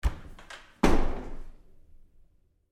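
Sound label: Door Bathroom Stall Open close-up Med with Bounce; Bathroom Sound Effects; Big Room Sound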